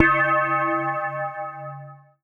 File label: C#, BELL GONG, Synclav FM; Big Fish Audio